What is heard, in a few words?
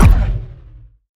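Sci weapon Shot Medium Blaster; Power Penetrator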